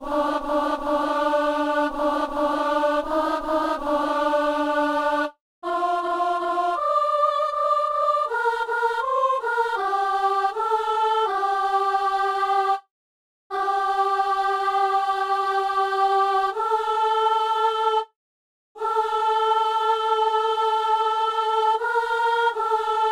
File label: soprano; Psaume 103; Messe du 17 janvier à Saint-Denis